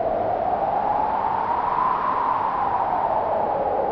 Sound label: wind